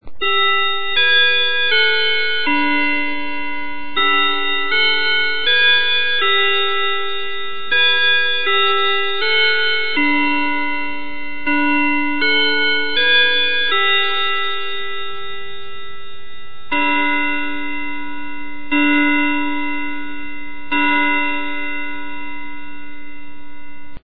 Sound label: westminster hermle